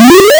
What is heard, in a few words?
Hard PCM Chip; chiptune